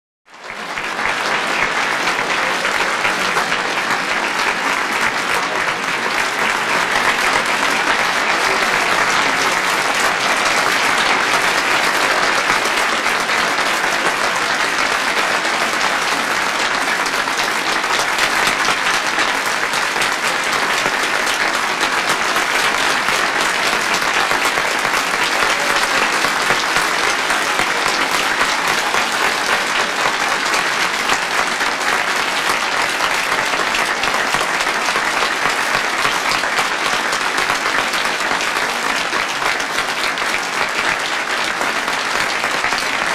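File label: Interior Crowd Applause; medium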